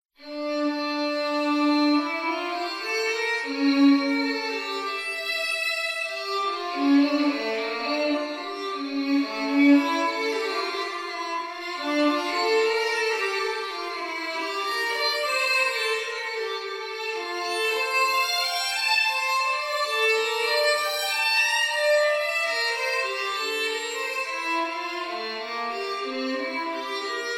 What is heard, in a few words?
Bach violin